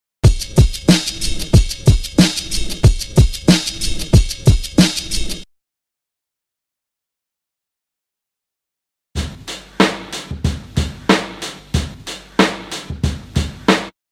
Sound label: Drum loop; BEST OF DRUM BREAKS 30